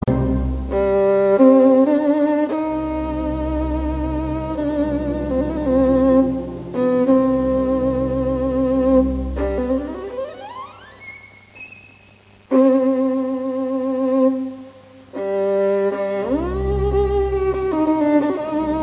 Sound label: violin